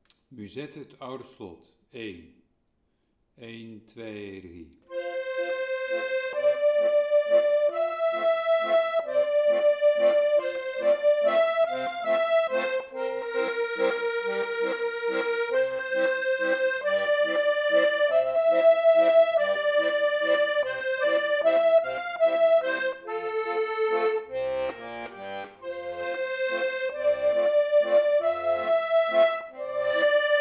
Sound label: musette